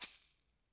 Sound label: Shaker, Latin Percussion; Drums; Drum Hits; Ableton; Factory Packs